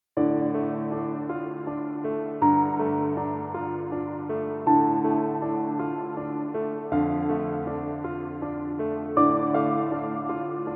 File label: piano